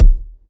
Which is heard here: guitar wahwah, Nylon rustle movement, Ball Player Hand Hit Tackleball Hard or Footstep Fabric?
Ball Player Hand Hit Tackleball Hard